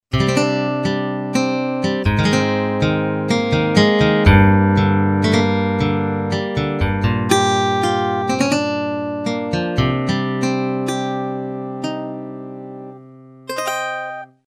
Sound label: guitar